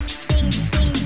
bing bing; "Mystery Sound Bite" Contest

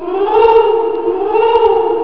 oooh oooh; "Mystery Sound Bite" Contest

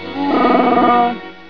christmas growl

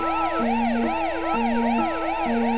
sirens

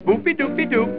boop be doop be doop

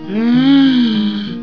loud breath

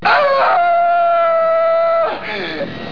scream

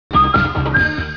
whistles

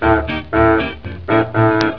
honks

